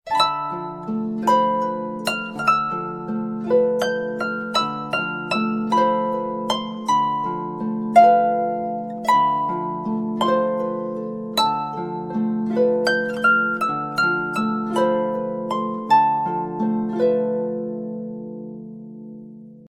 lullaby ringtone

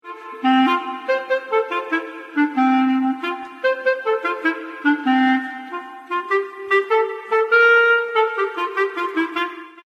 longer flute tune ringtone

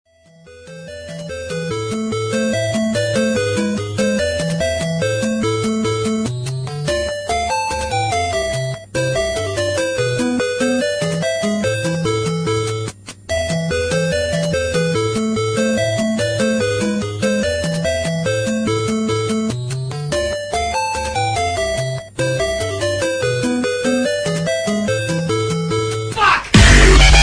dubstep ringtone; tetris

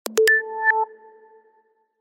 xiaomi wrinkle ringtone

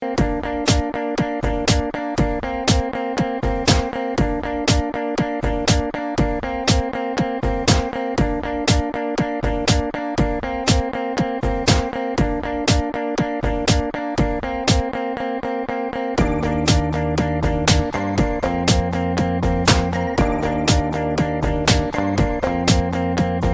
beach walk ringtone

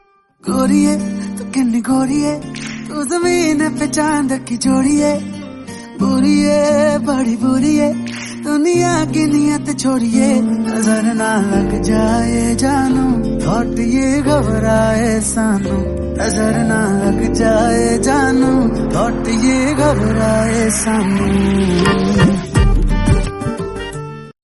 bollywood song tone ringtone

